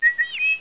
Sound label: whistle